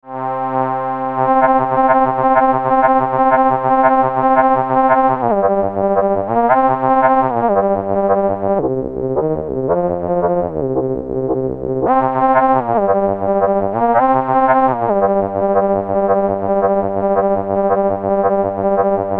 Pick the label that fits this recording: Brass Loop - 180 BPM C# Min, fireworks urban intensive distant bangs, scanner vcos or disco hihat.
scanner vcos